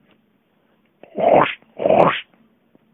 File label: scratch scratch